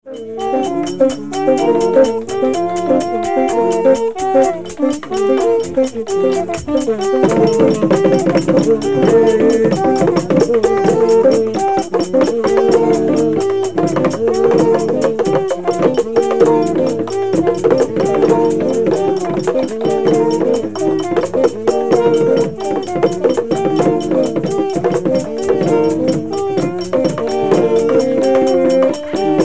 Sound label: bakuba Zaire horn